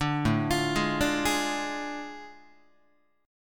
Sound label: A Major 7th Suspended 4th Sharp 5th, down, Guitar; AM7sus4#5; arpeggiated; JGuitar